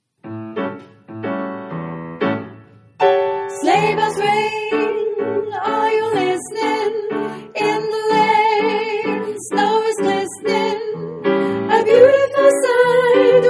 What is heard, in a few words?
All Voices